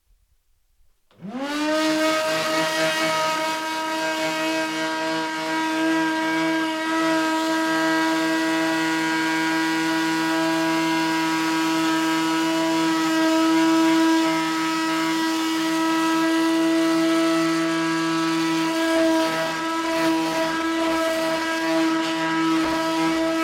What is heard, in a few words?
Hoover